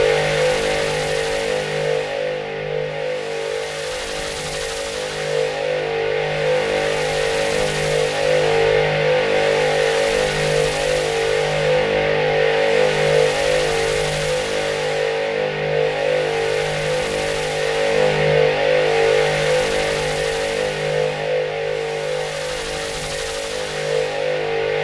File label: Energy Short Sword On